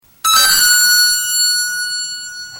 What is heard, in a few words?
Halloween Suspense